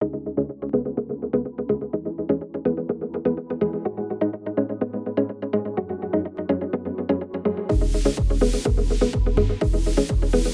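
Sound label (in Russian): космический реверберационный слой быстрый ручной вибрато доступен электронный короткий; saundtrek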